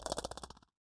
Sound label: Shake Me